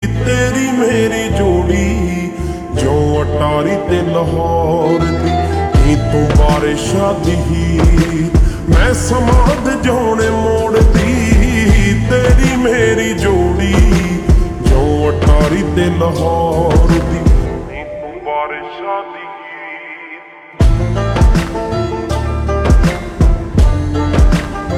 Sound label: Ringtone Slowed Reverb